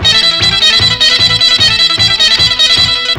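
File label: ETH TECH LOOP; Zero G - Ethnic; ETH TECH HOUSE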